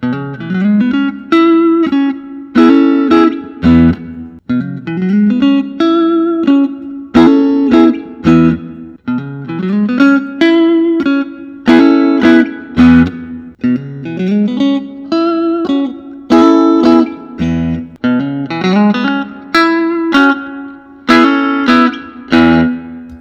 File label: guitars; fender custom shop; masterbuilt 62; john cruz